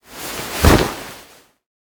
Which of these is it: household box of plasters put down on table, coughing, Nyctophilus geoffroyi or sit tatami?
sit tatami